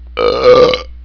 burp; dmc

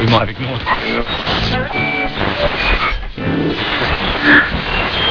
tune; svencoop; sharknest

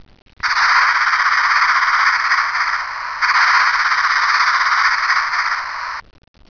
snake; svencoop

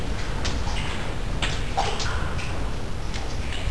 wet; svencoop

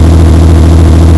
truck moving; svencoop; adamr

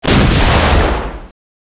gunner boom; gearbox

